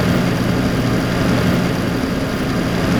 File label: fan interval